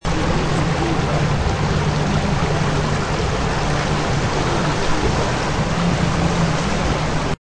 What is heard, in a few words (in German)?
donau